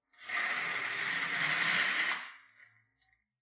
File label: curtains; soundscrate